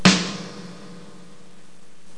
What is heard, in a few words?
drum